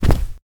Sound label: Rug Jump